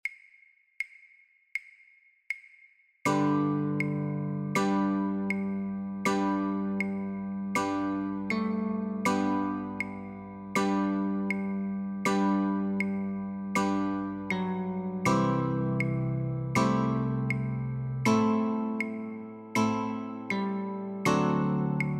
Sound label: Notes and Chords, guitar; The Muffin Man